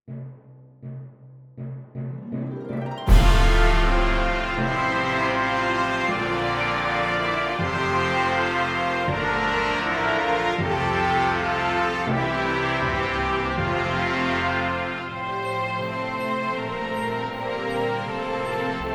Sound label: Shining Stars Horns in Fifths